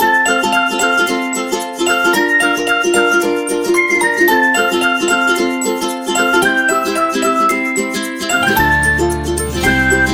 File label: Happy